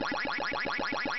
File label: power up